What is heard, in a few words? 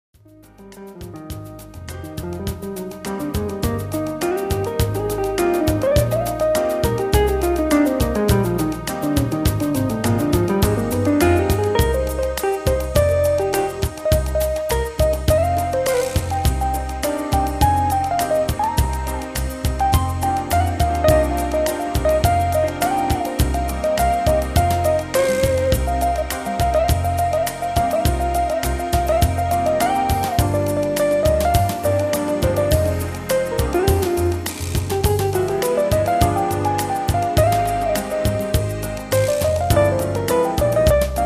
music horn sample midi